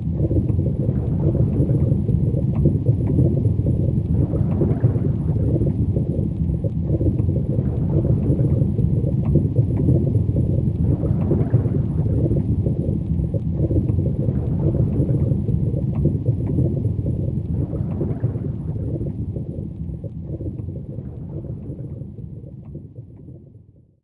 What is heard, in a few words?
Magma